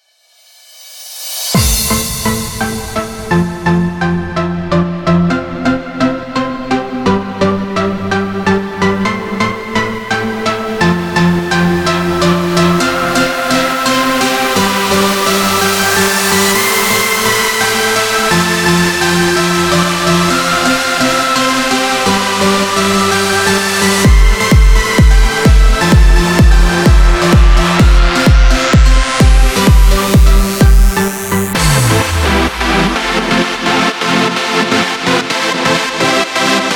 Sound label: Big Room Tools, Progressive House Electro House; Noisefactory; Big Room Tools Vol. 1 - Second Edition